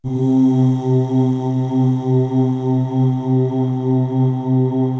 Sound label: choir C2, Choir UHH, KORG DSS-1; ProSamples vol.55 - Retro Sampler